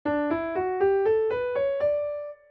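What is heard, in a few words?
scale D major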